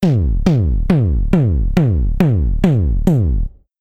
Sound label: Loop 138bpm, Drums; leloops